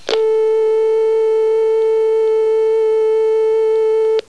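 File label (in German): Telefon frei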